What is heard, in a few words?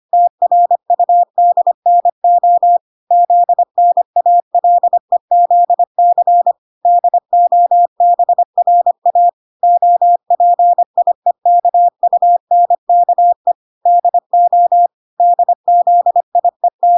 25wpm